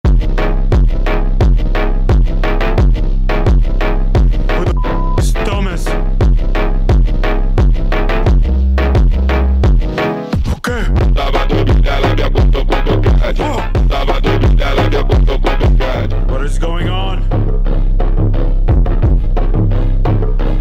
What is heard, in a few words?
Phonk